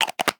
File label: Pill Bottle Close; Medical; Blastwave